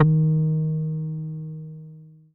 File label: OSCTKBS EB3, Vintage Keyboards; Big Fish Audio - First Call Vintage Keyboards; OSCAR